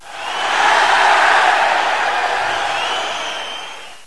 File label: Audience